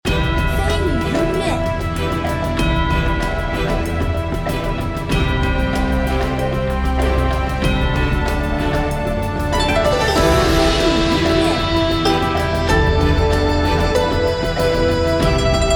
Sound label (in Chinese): 登录 磅礴 大气